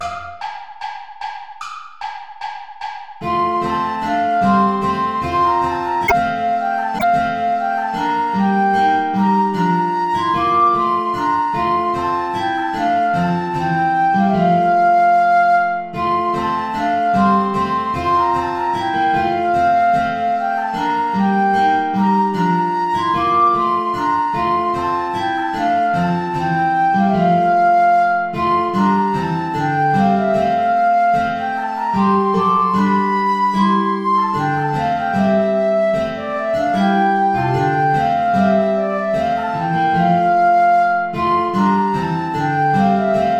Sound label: ballet play along without guitar